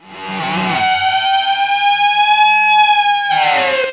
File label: Feedback, Industrial; Nasty